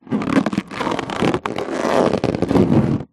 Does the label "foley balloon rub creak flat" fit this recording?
Yes